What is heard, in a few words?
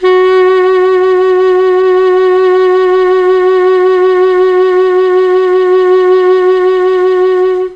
F#2 ALTO SAX, Mellotron; Mike Pinder - Mellotron Archive CD; CHMB ALTOSAX